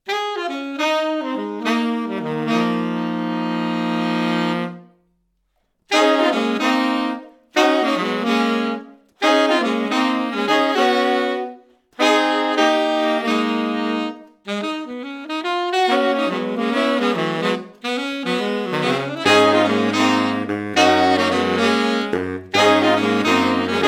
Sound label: saxway